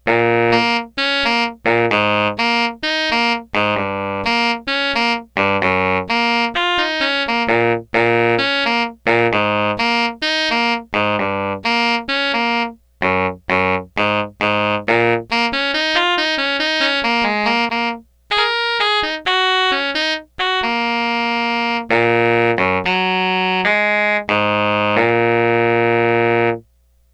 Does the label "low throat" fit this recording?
No